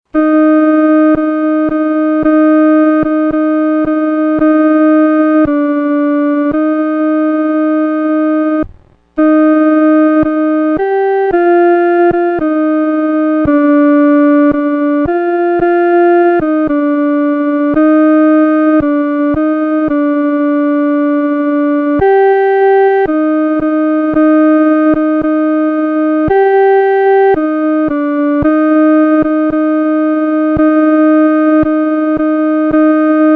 独奏 第二声

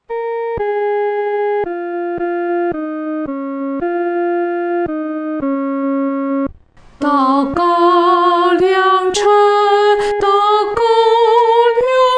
独唱 第一声

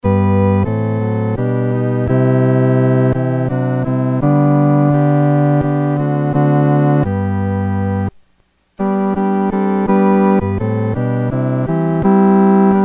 独奏 第四声

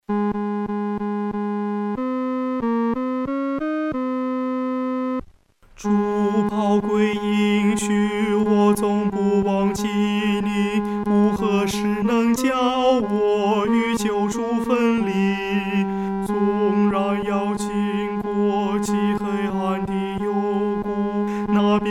独唱 第三声

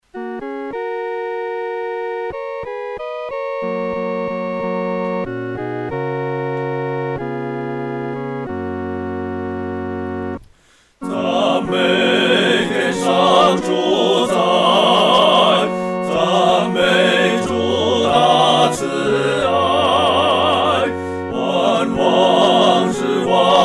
合唱（四声部）; 赞美天上主宰

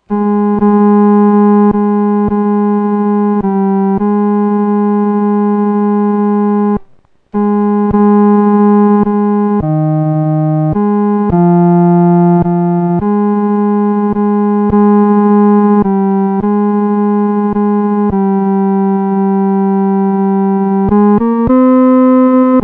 独奏 第三声; 岂能让主独背十架

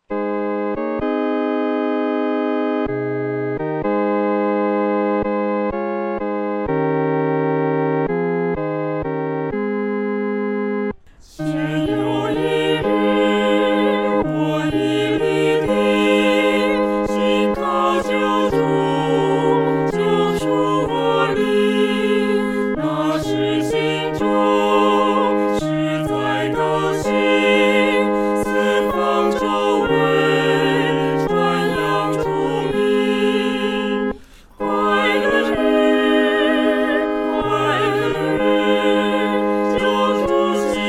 快乐日 合唱（四声部）

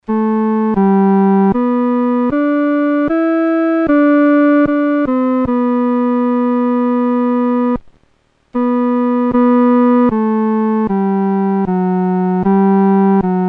独奏 第三声; Doxology; Thomas Ken, 1757

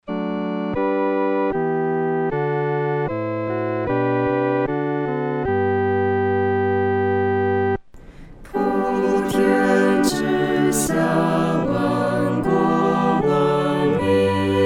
合唱 四声部

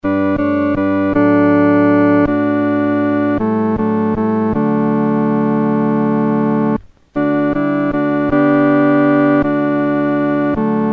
独奏（第二声）; 凭祢意行

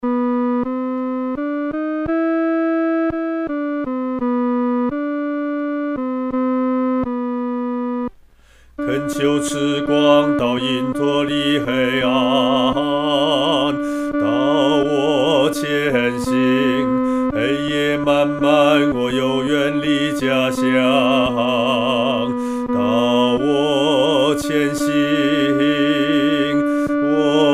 独唱 第二声

